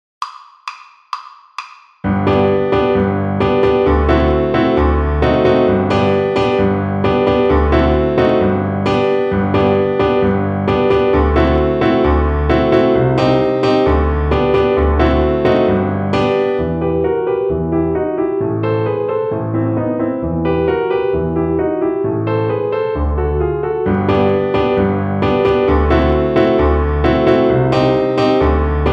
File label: String Gym, Piano Accompaniments